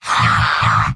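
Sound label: male laugh short; Heavy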